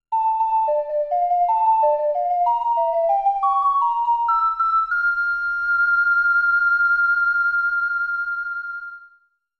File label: Ocarina ''; The Legend of Zelda Majora's Mask